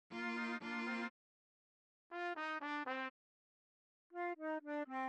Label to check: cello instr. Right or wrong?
Right